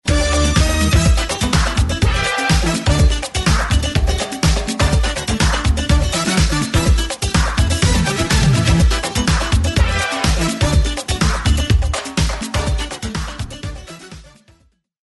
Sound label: conga; cstrike, mapend